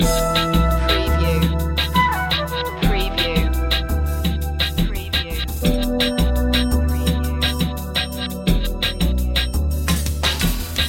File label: stimulation loop